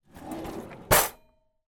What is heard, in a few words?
household drawer cutlery kitchen open